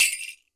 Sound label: skranz, WORLD PERCUSSION; Best Service ProSamples vol.52 - World Instruments 2; MIXED PERCUSSION